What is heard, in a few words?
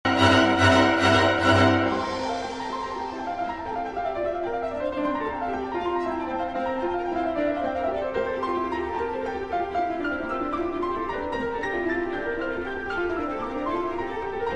Piano Concerto